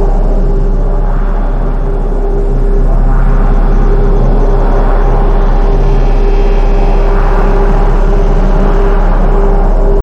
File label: portal loop; cinematic